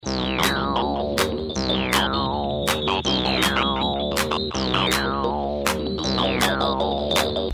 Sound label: weird